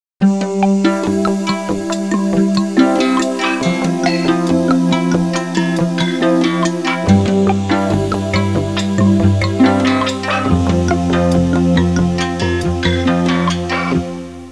suonerie musica pop

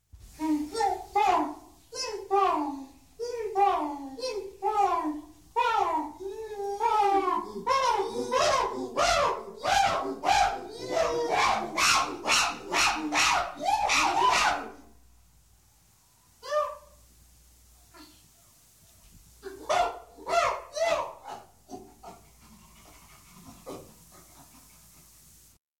uccelli birds, animali

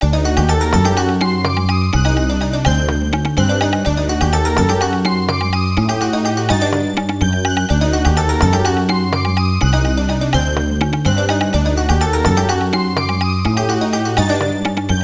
musica traditional est; fareast

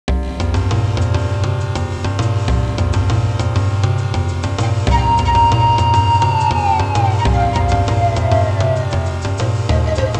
suonerie musica ritmica

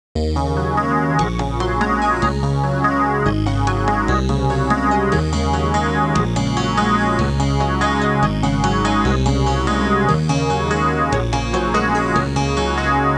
musica elettronica

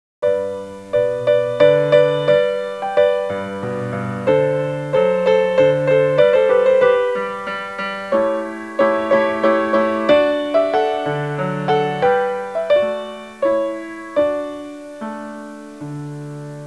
suonerie classica; musica classical

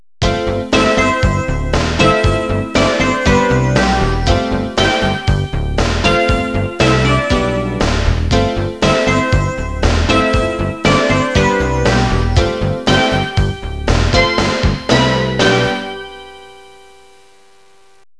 suonerie businessy

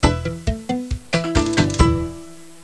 suonerie musica jazz

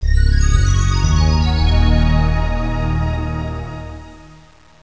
audio suonerie musica mistica

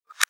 suoneria botton; beep

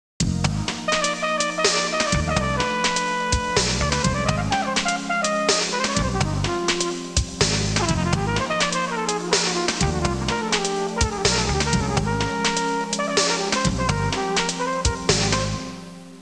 musica urban

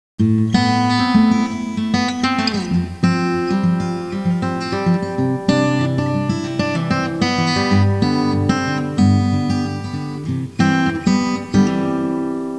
suonerie contemporanea; musica contemporary

